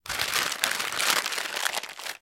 Paper Bag Open Top; paper mvmt

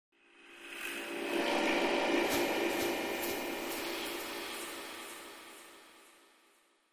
Metallic Echo Buzz, scary voices

